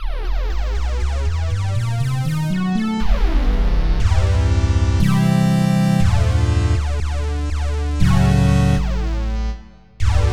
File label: ARP Saw Sync